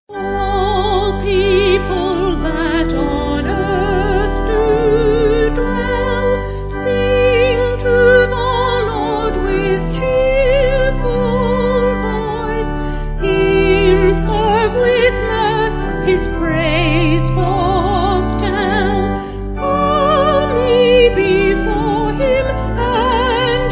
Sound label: Vocal Organ; All People That On Earth; Old 100